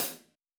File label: HH mid